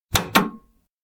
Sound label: power off; anemoi